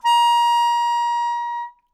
Melodica Long, Long Notes Chromatic

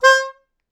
Melodica Short; Short Notes Chromatic

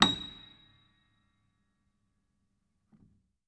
Note High, Piano; Piano Miscellaneous

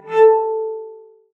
Cello D Bass

